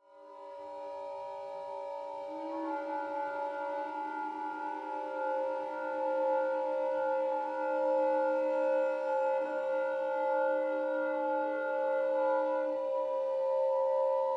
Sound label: TREE recorder; TREE-III